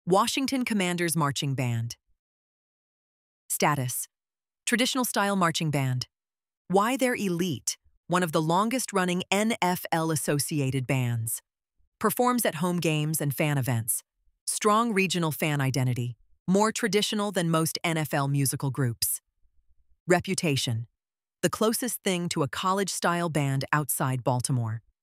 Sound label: Marching Band; Washington Commanders; ElevenLabs